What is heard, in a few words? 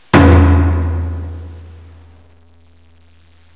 Taiko; sound analysis; sound instruments